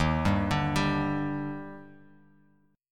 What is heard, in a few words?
Eb Major 7th; EbM7; arpeggiated; JGuitar